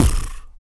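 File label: vox percussion, Vocal Planet Jazz FX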